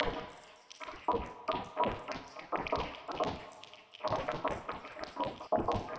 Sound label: Tonal Re Draw, sparse soundscape